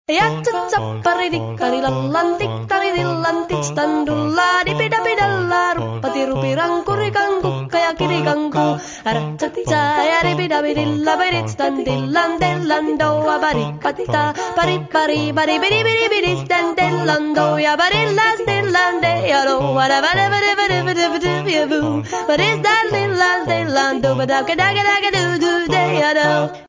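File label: finskaya polka mono, Finnish polka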